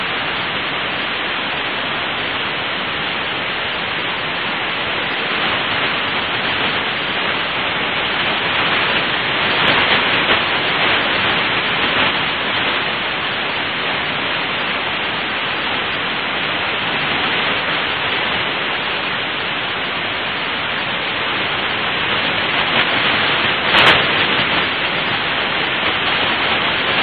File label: jupiter l burst hf